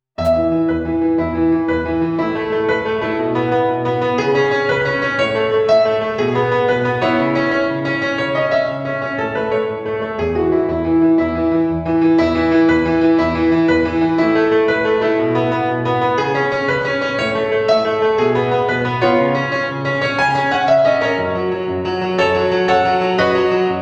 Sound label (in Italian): Canzoni per bambini al pianoforte